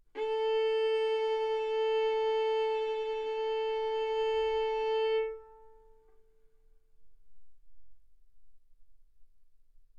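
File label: Violin arco mf A string A4 B4 stereo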